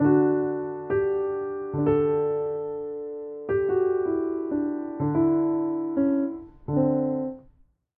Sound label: Piano Sequence; mass effect 3 citadel gamerip 2013; Silversun Strip